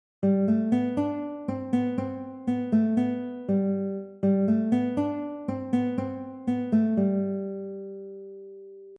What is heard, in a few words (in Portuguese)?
melodia escala maior; guitarra; melodias na guitarr com escala maior